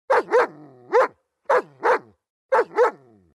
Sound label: german shepherd sound